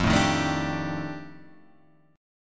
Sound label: F 13th F, chord